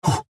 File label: Punch